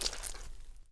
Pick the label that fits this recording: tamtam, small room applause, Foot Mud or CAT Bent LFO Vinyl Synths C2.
Foot Mud